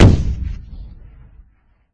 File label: Cannon; MapleShrine; mkxp; gameasync